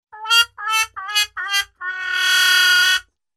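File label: sounds of regret on trumpet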